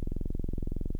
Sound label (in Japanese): ミニ・モーグ, 電鳴楽器; 最低音４音色